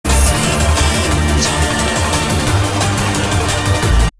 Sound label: Drum and Bass Jungle, UK Bass Radio